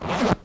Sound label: sound bag open